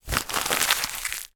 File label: office envelope padded scrunch up